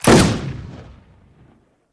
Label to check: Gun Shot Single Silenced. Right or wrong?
Right